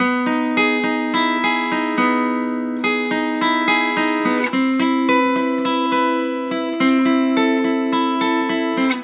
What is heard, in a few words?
acoustic guitar dry, no airspace; airspace hybrid reverb and delay; modeaudio